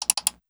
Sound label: click double